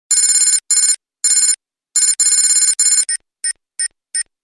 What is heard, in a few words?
Futuristic Ringer